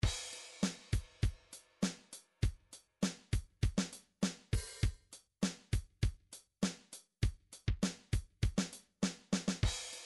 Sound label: midi drums drums funk